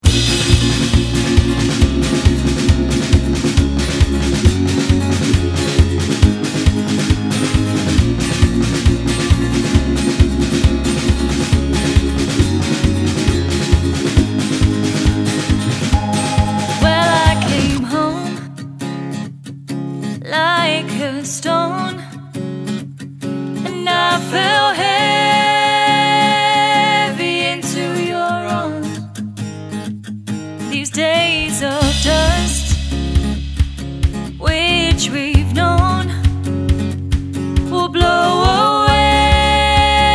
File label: Rock Pop Band; Hampshire